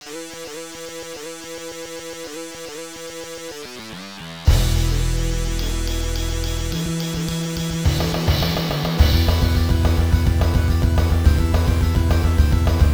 Quiz Fight BGM; Quiz King of Fighters; Motorcycle Gang Quiz Fight BGM

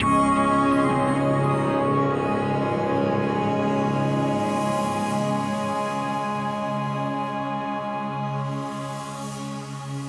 Tension - Relic Stinger

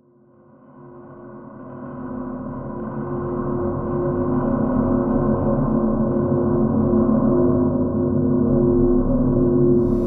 Ambient - Faded; Pools

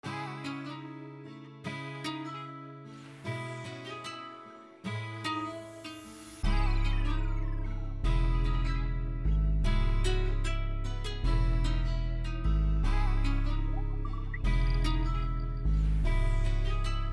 Music Calm Mood; Escape from Russia: Mobilization

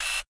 Catapulte Servo

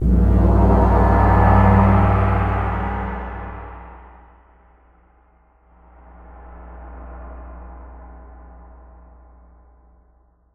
tension end; biomutant

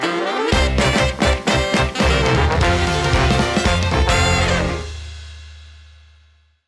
Win Jingle

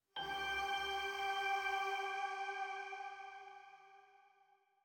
Melody Note G4; Cave Music

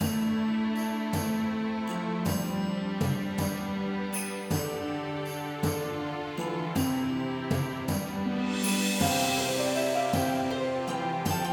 Ambient Musik; Sir Tincan Adventures in the Castle; Android

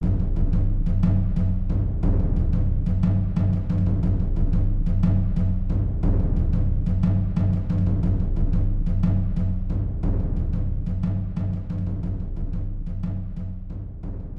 Drums Only; Skylanders 4 Trap Team; Operation Troll Rocket Steal

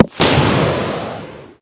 Rocket launch

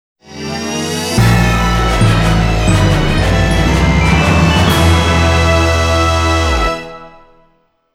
Win; Lord of Vermilion III

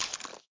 Cat launch aim

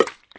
Pop

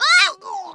Face Bail Female

